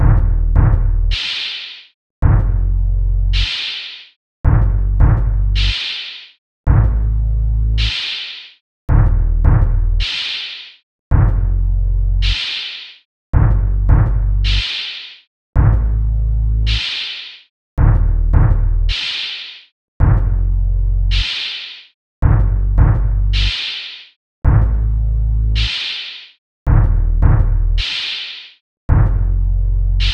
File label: Sine assignment